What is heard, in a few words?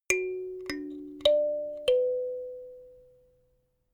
notification kalimba sequence minor